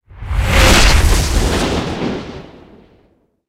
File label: plane passby layer; War Thunder